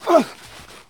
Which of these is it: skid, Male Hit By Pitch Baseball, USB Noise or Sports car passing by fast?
Male Hit By Pitch Baseball